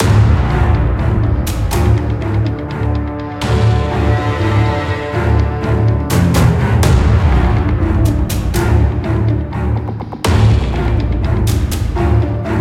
bgmusic medium combat; Iron Marines

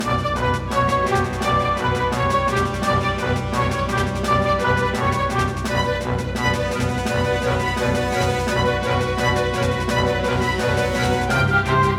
Background; Widget's Odyssey; PSP

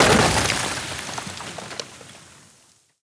ice break

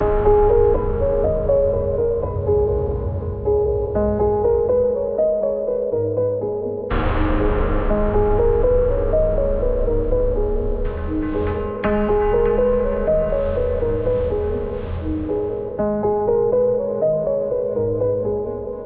Piano Theme; Cutscene

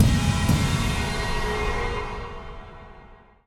Hi Hit

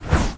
Flutter takeoff; Blastmorph Pinball; xbox series xs

